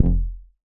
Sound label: bass F1, Korg MS-20